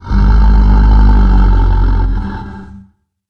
growl, monsters

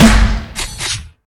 grenade fire

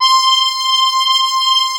tuba loopnote

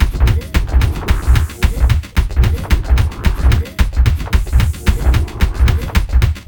spiderbot strafe

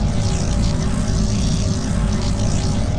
Electrical Machine

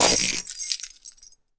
drop

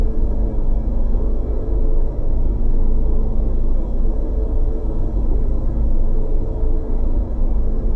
raptor fly, vehicles

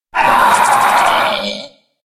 death, player

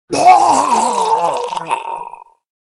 death, player

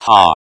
gasp

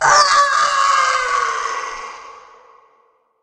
falling, player

